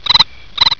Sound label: Birds; de pipeline; cstrike